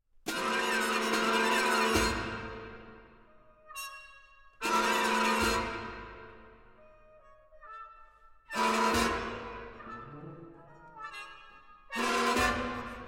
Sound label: Brass; Gadfly Suite; Brass of the Royal Concertgebouw Orchest